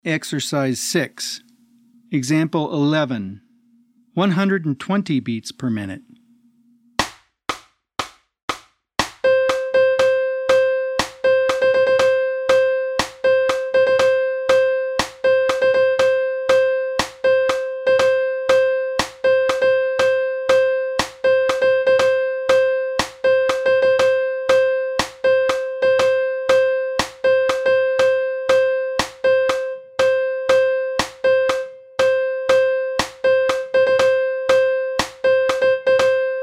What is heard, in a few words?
120 BPM